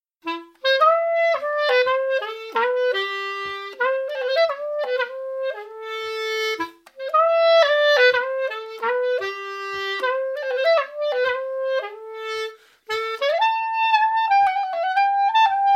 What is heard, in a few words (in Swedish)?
klarinett polska; orsa; sjons gubbens polska